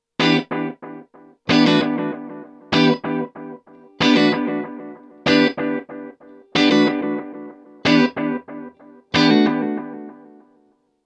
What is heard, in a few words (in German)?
alle Regler auf 12